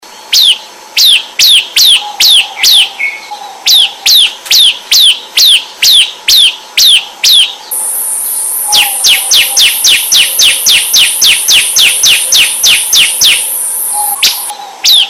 Suara Kicau Burung Ciblek Full Keciap Keciap

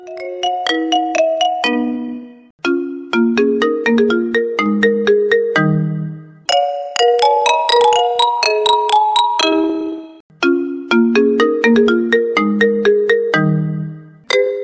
Nada Dering iPhone 15 Pro Max

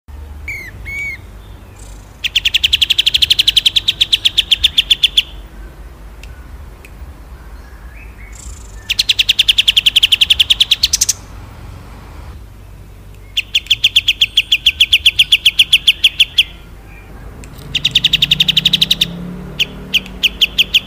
Suara Burung Kapas Tembak Masteran Ngepik Merdu Panjang

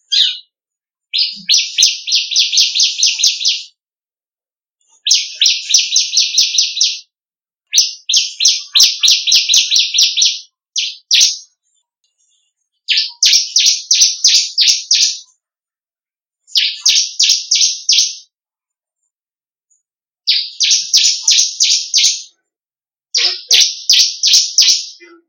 Suara Masteran Burung Ciblek Kristal Merdu

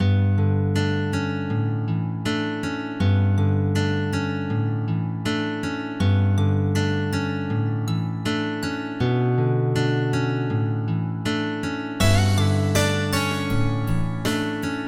Nada Dering Nokia Jadul Airy

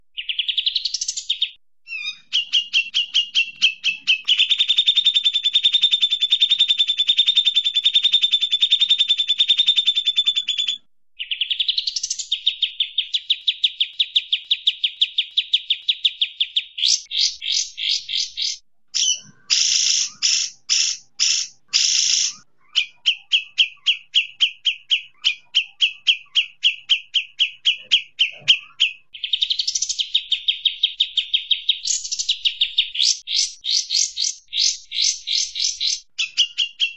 Suara Kicau Burung Kapas Tembak Merdu Ngepik Panjang Speed Rapat

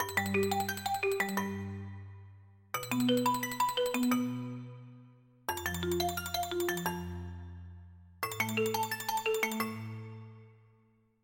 Nada Dering Nokia Jadul Luminosity

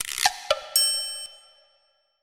Nada Dering Nokia Jadul Arbegio

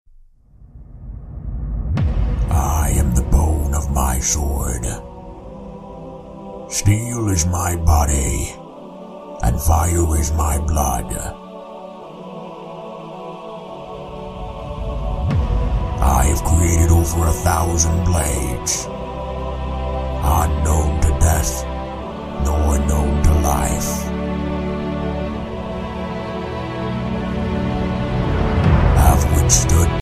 Nada Dering Anime

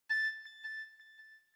Nada Dering Nokia Jadul

